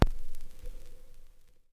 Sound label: stylus